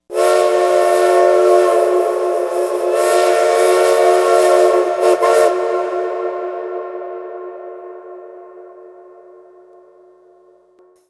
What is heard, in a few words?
Train Whistle Train Whistle Steam; Colebrookdale